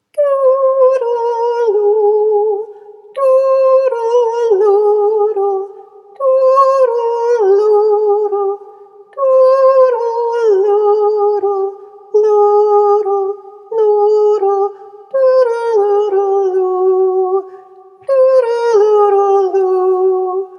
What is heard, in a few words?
bass tenor warm up